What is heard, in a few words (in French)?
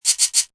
bruit; MOBICLIC 74